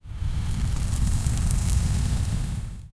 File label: fire